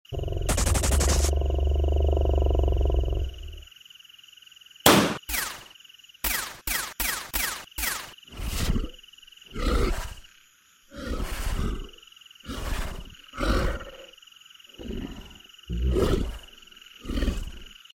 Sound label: Polyphylla olivieri; کرم سفید ریشه